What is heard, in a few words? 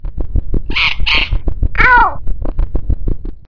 eat; TomCat